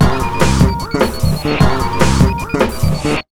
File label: 150, Big Beat Drumloops; USB Soundscan vol.25; AKAI